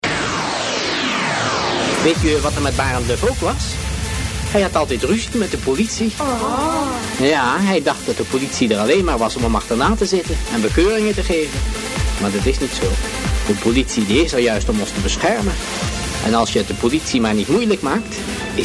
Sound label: Jingle; Audio Fragmenten